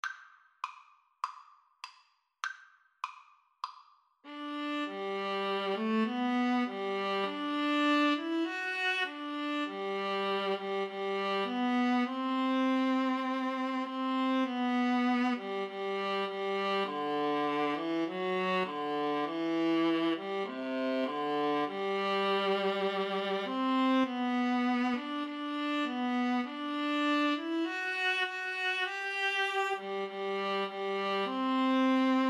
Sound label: Violin-Viola Duet; auld lang syne